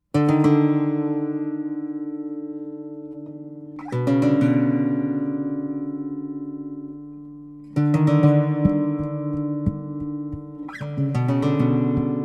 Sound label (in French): guitare flute